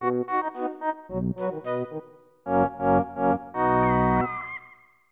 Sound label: Pirates Realm Fanfare; Glover; Nintendo 64